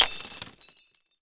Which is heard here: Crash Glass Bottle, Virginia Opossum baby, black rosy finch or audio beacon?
Crash Glass Bottle